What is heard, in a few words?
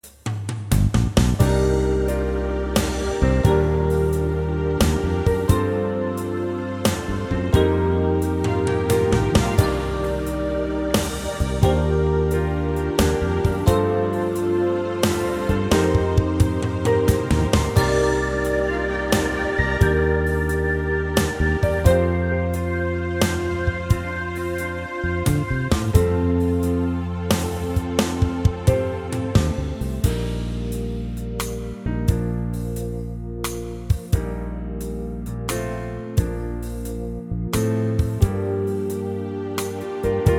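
no sax, Backing Tracks for Male Vocals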